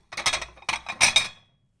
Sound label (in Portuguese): Pratos